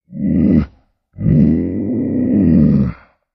Puma Cougar Growls Lo, big cats cougars

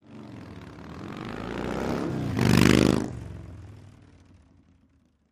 Harley driveby

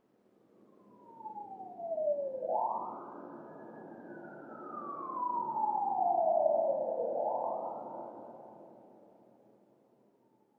Siren Slow Police By

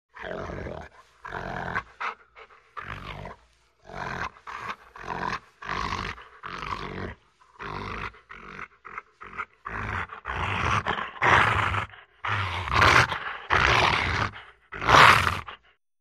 Dog Rottweiler Growl

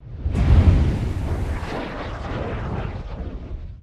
Dragon Fire Whooshes, No Crackle Element

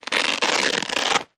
Velcro Tears, Slow

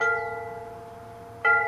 Bell Buoy Ring

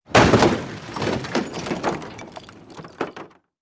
Vehicle Dropped On A

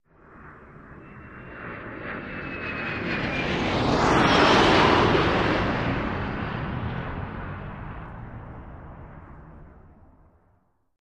Airplane Bys Jet Prop, av-8b-harrier

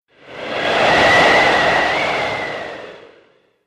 Ind Gust Wind; gusts

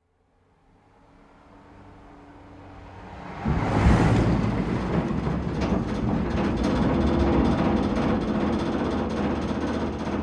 Car By Over Wooden Bri

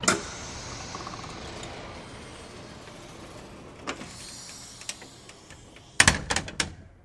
Hospital Door Close, pneumatic

